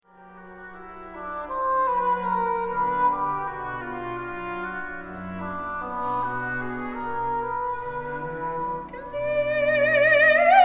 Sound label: Opera Singer Bach; Boston